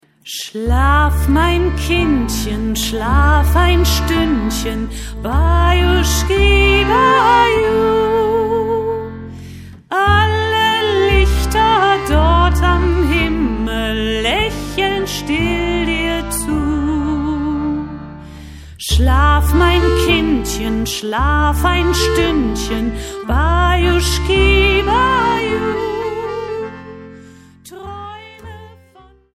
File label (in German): Bajuschki Baju, Schlaflieder